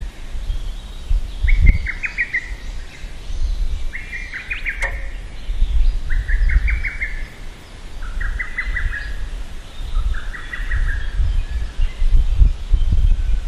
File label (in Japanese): hototogisu